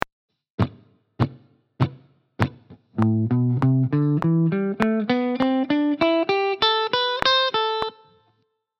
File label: blues, guitar